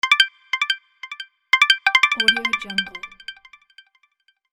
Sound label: digital ringtone